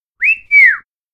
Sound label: wolf whistling; 口笛; 映画やドラマなどで良く使われる口笛の定番音源